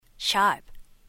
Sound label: Pound, Key tone; i-mobile PANO DC5210; English